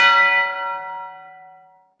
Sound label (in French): cloche; Limonest